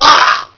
flinch; gearbox; demoman

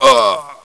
pain, player

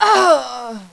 shot, female

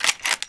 uzi cock, weapons; firearms

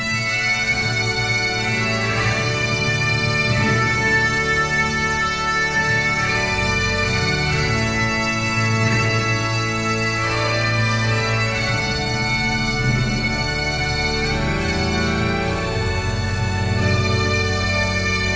bagpipes; dod; dod qorhall

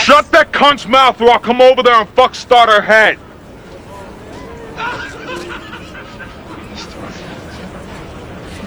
gun; dod

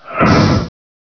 door stop; gearbox

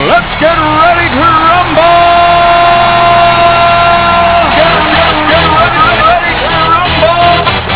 rumble

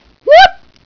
woot

